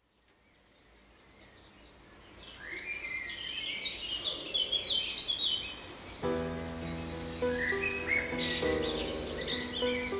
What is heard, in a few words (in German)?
vogeln; musik zur entspannung